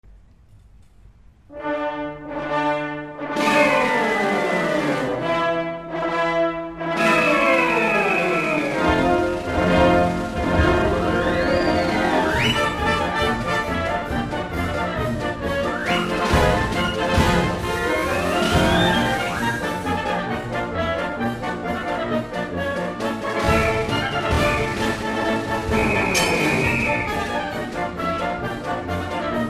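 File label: Circus March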